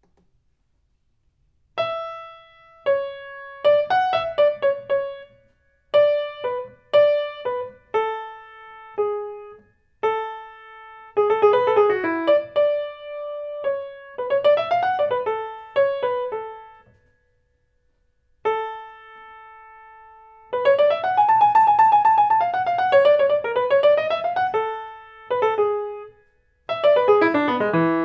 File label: Clarinet Concerto; Mozart; ear training level 3